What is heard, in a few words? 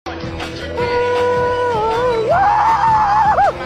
hey yaaaaahhhh